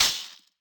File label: Shaker; Sample Set - Interesting Drums